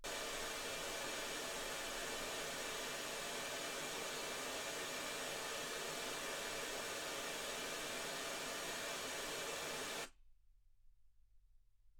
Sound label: wgn